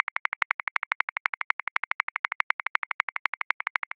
Triplet Hip Hop, Drum Booth, Grooves; Ableton

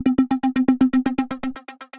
Choppy Synth, Synth Rhythmic; Ableton; Glitch and Wash

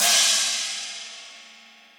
China Cold Tight Room, Cymbal; Drums; Drum Hits; Standard Hits; Session Drums Studio

